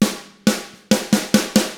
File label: SLOW ROCK IN, Drums; ILIO; Double Platinum Drums 1